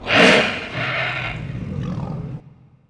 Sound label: rasp; Eyewitness: Dinosaur Hunter Deluxe; trex